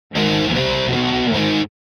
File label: Guitar Heavy Metal Finale Chords - Short; Hollywood Edge; sound designer's toolkit v2